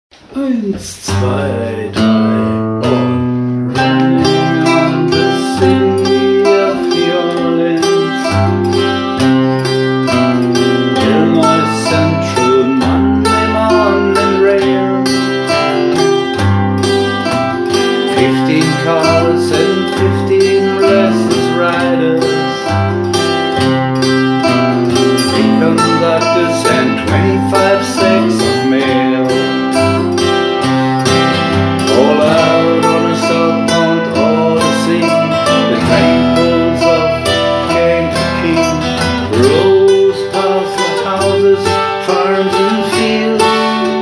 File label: arpeggio